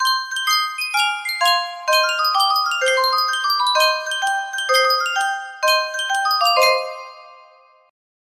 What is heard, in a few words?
sankyo miniature music box, music box melody